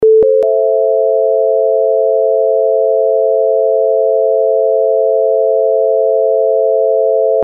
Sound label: minor chord